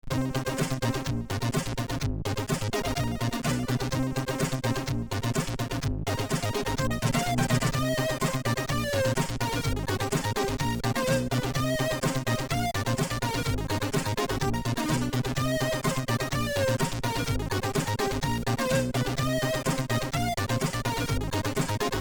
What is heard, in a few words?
Disco Remix, sid